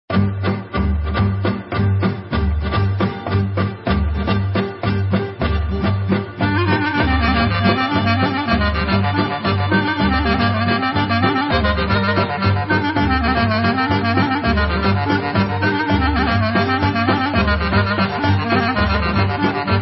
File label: Kopanica Gankino Bulgarian